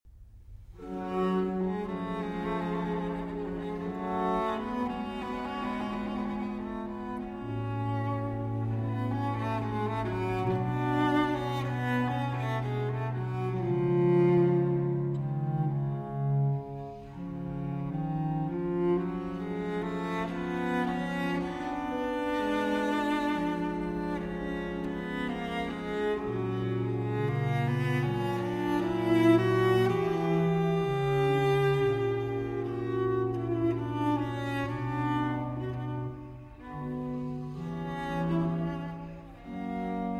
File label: Cello Sonata in G Minor, Sarabanda; Largo; Vivaldi